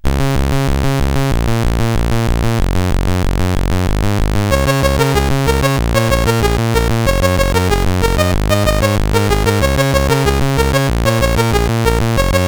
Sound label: Intro sid CSG8580R5; Infiltrators